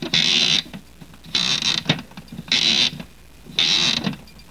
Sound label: WINDUP